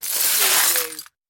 Debris Glass Sweep

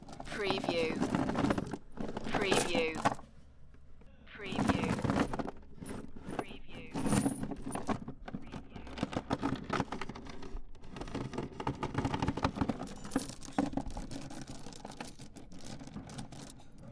Chains Foley Lots of Chain Movements

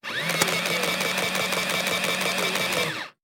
Calculator Feed Paper

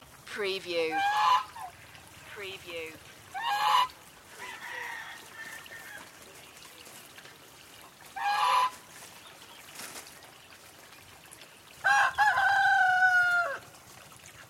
poultry pond ambience loop

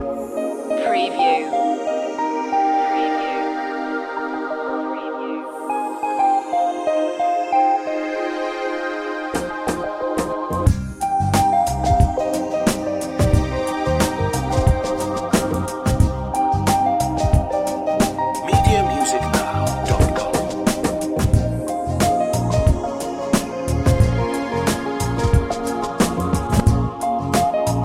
smooth waves loop

